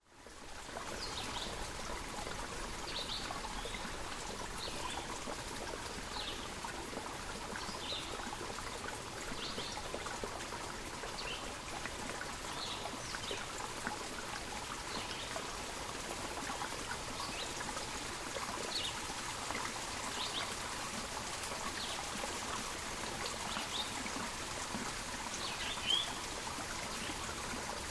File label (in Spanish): naturaleza para relajarse